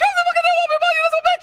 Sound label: bicycle kick; mk 2; liu kang